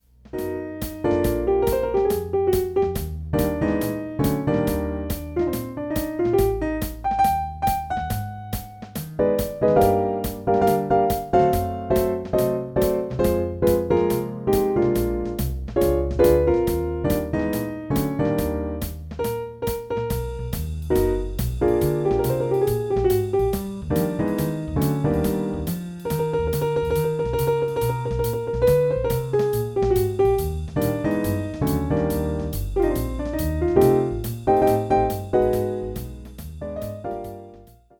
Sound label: Jazzy Feel